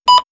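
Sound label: Signal, electronic fx; X-Static Goldmine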